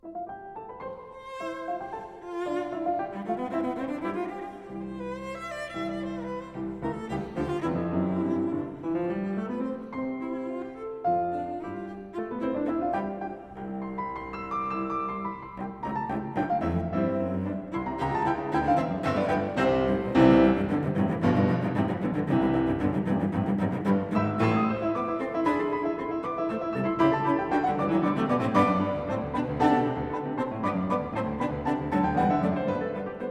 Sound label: Sonata for Cello and Piano No.4 in C, Allegro v